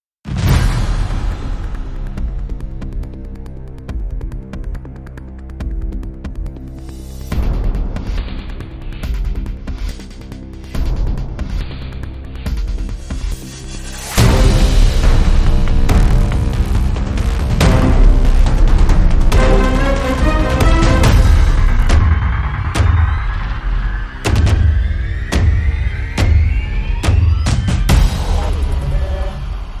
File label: Less Strings